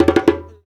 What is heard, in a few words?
KAKID, African Perc; Spectrasonics; Supreme Beats; African Contemporary; Afro DRMs